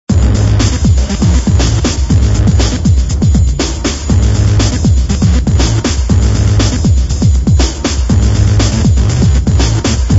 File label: toxic beat